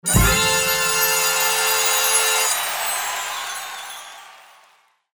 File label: jackpot applause